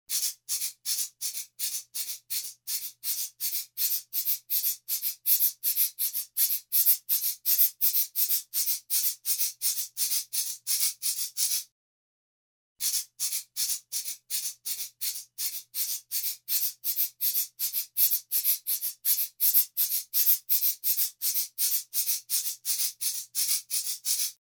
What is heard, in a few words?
LEDR Behind